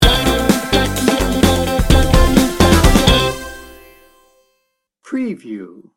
funky bounce sting bumper

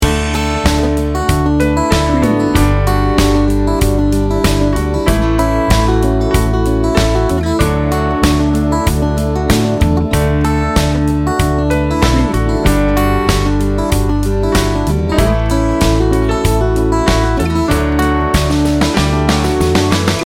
americana acoustic folk loop

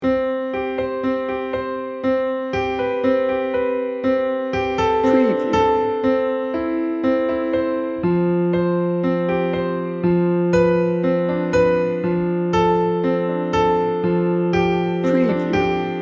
atmospheric enchantment loop

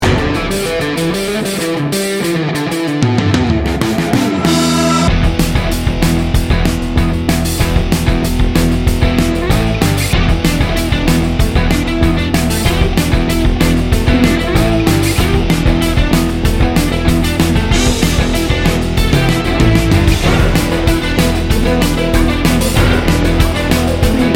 rock fight

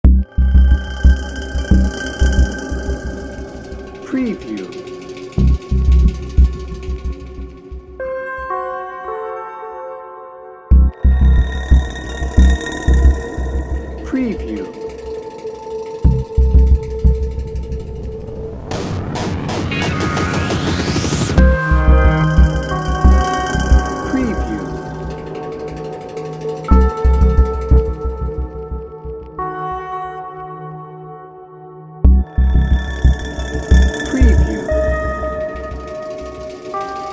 disturbing trends no drums; Dark and mysterious, featuring pulsing textures, eerie strings and a groove that creates a; ense and imminent danger. Menacing and sinister. Great for tension, crime, mystery, suspense or pure evil!

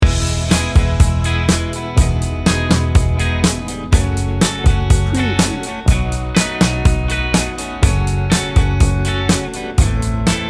summer nights loop

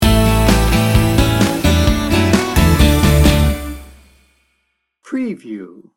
happy heart sting bumper

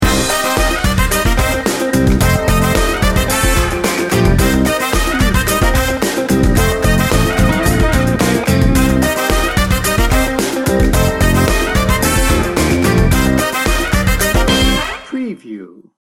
funky bones

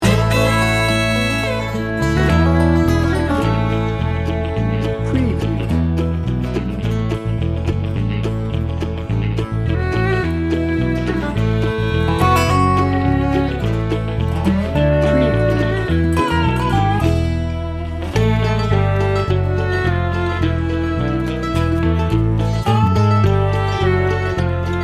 country hoedown no drums

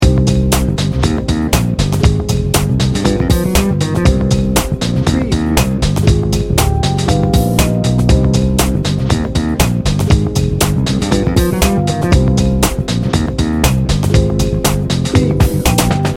funky junkie loop

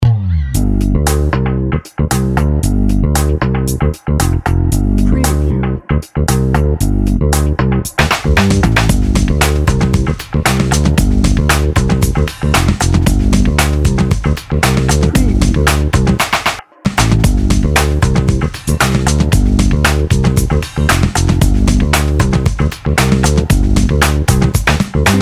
sleek and confident

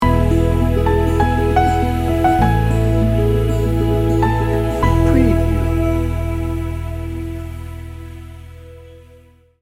homecoming sting bumper

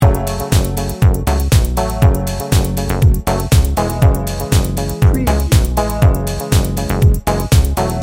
moody dancer loop

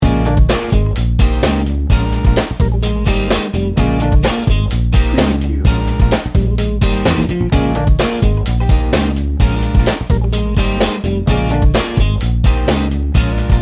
funky feel good loop

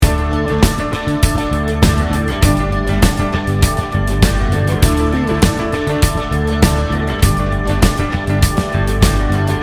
good time rock loop

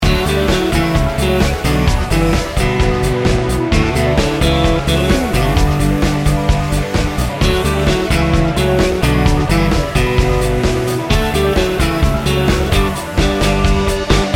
upbeat soft rock

